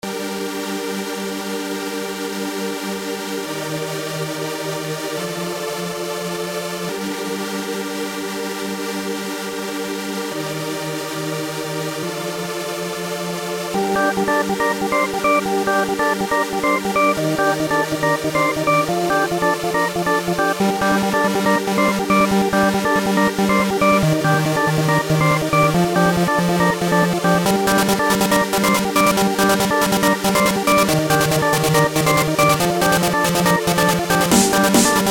keygen

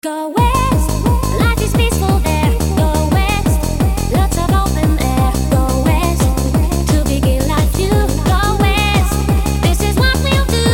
happy hardcore

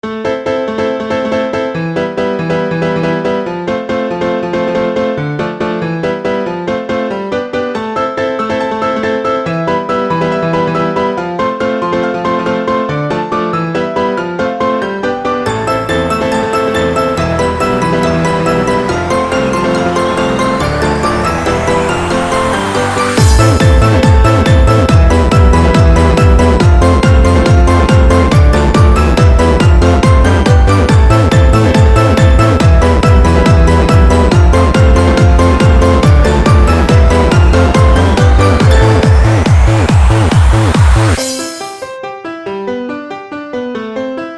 random riff

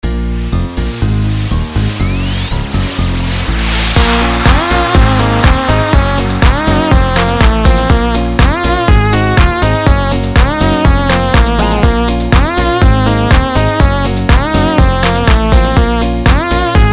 chiptune house project

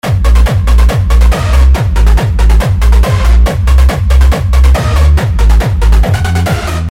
kick bass

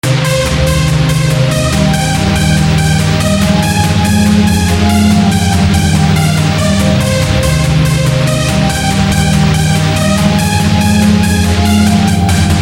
hard import pad and lead

